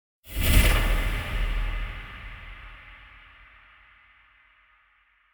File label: Impact Muted Hit Reverb; Hollywood Edge; sound designer's toolkit v2